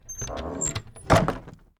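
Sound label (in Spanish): CERRAR PUERTA, close door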